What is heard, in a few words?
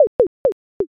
dice